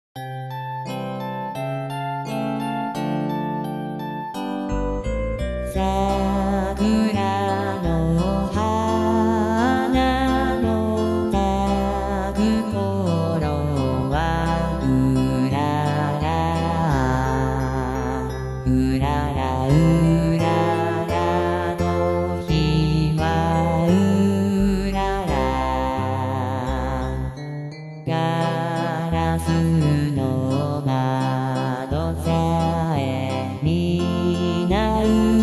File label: bass